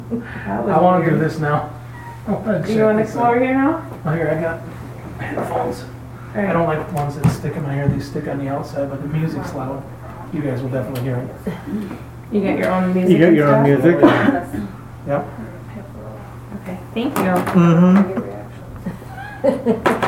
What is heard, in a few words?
meows